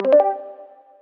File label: system battery full, accessibility; BlackBerry OS-10